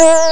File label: magic; Amiga Soundtracker sample